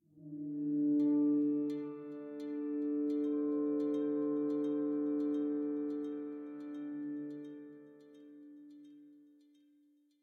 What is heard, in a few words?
Tension Creepy